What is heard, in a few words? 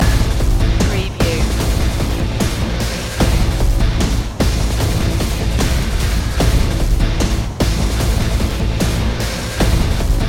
knockdown loop